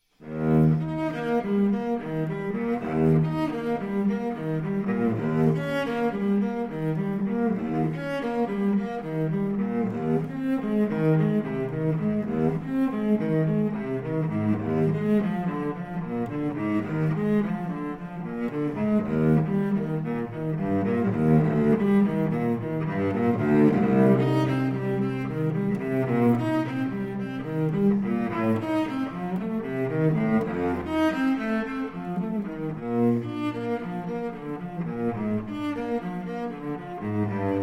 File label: Cello Suite in E-Flat Major, Prélude; Cello Suite No. 4; BWV 1010 I.